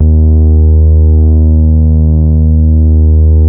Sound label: BASS STOP; Masterbits - Vintage Voltage; VV BASSES